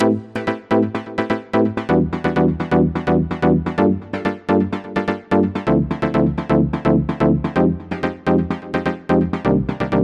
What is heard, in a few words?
ring, ringtones; sound of oo website ringtones